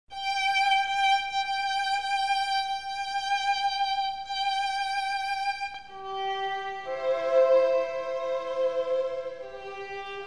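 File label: Bridal Chorus violin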